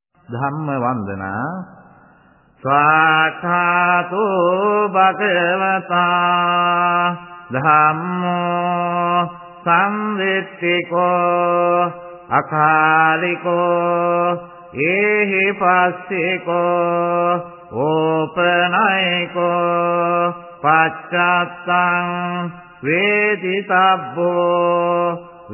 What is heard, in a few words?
chant, Buddhist Chanting